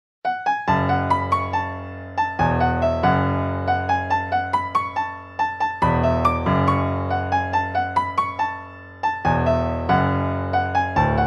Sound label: Piano; Geometry Dash